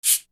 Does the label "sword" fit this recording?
No